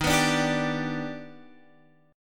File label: D sharp Augmented Major 7th D sharp, chord